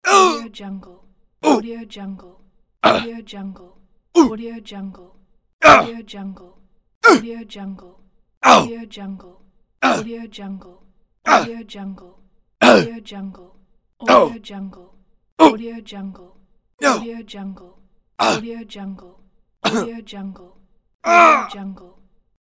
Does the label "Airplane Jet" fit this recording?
No